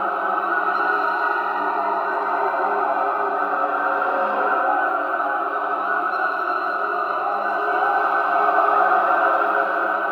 Energy Beam Loop; Ambience; Warframe; Entrati; Dead End Secret

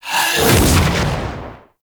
Ghost Possess; Warframe; Purgatory Ghost; Abilities

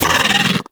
Bug Vocal Pain; Hunting Critters; Inf K Drive Bug

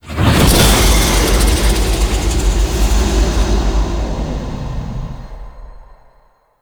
Sealab Stalker Stinger; Warframe; The New War

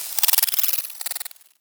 Tech Movement; Ambience

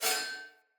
Split Sword Accent; Weapons; Player; Swords; Starter

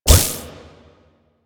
Corruption Pickup Small Spawn; Warframe; Missions; Zariman

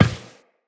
Step Glass, Footsteps Walk; Tenno

